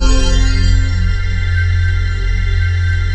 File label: Movie C5, Atmosphere; Giga Samples Collection